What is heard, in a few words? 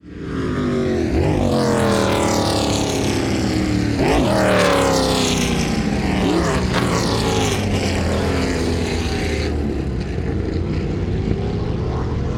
Can Am downshift